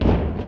Foley lo

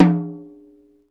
TOM HI, Roland; Club-50 - Foundations Roland; Naturals A

